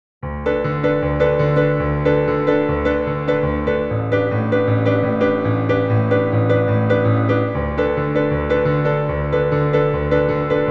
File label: Playing